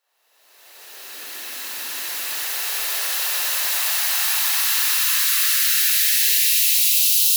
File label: House White Noise Up, 128 BPM; FX